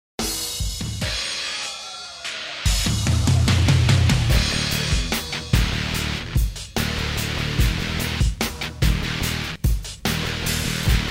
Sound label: rock